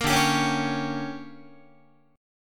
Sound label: D Major 7th Suspended 4th Sharp 5th, Guitar; DM7sus4#5; strummed; JGuitar